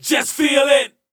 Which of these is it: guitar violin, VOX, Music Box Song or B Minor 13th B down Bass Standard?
VOX